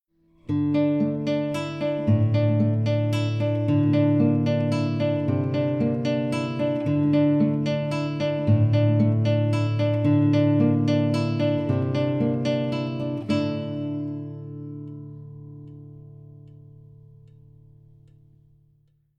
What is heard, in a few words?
Right Hand, guitar